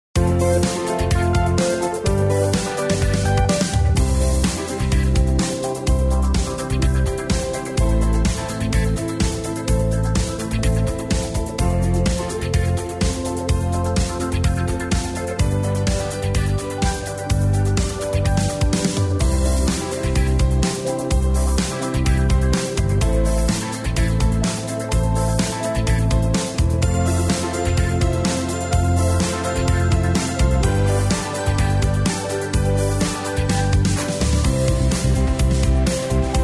rhythm track, square dance music

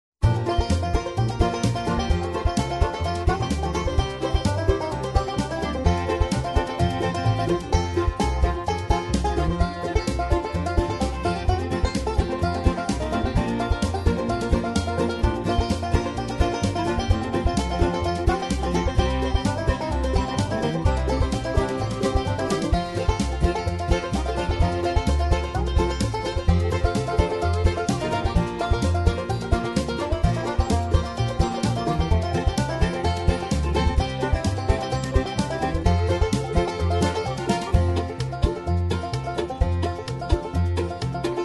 banjo no melody, square dance music

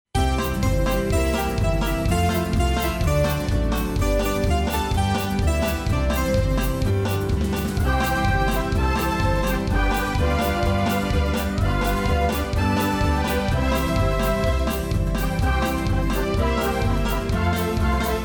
rhythm track, square dance music

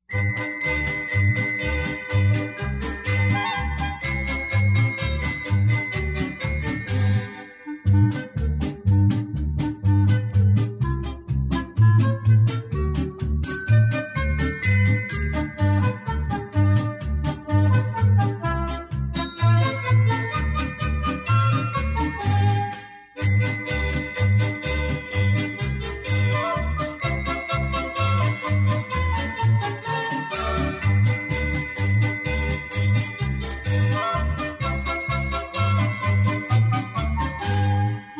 jingle bells, Square Dance Music

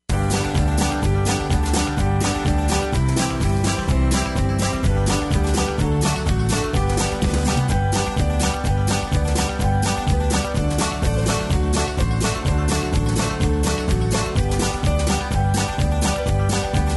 yodeling song rhythm track, square dance music

